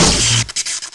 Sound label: Electric Shock